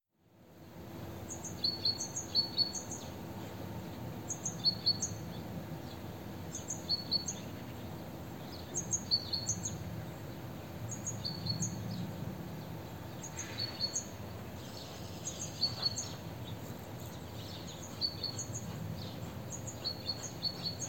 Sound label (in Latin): Parus major; SETE